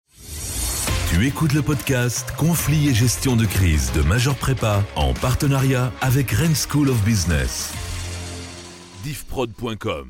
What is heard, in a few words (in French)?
Jingle Podcast No Guitars; Major Prepa; Conflits Mix V2